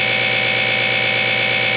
spin

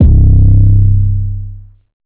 808 low; cymatics

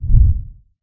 tele throw

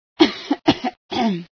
cough, female

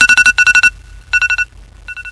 iphone

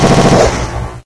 plasma, weapons

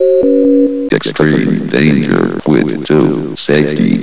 run

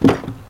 shutters wood place

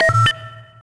blip

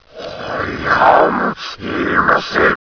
zombie alert; zombie barney

